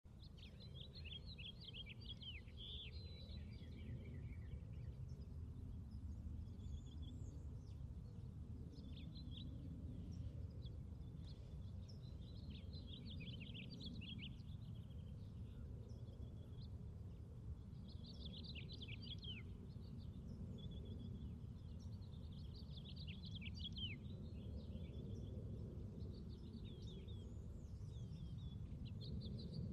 v nebe ochen vysoko proletaet samolet

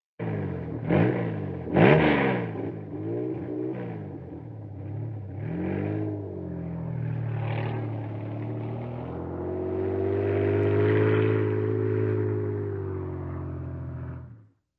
medlennyj otezd

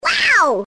multjashnoe; Вау звук

